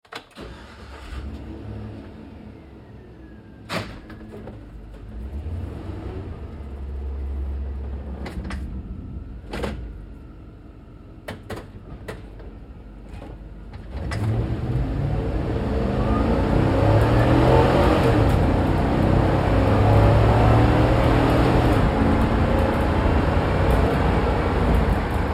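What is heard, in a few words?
Truck GMC Savana Interior Drive Accel Decel Fast; 2010 GMC Savana; Trucks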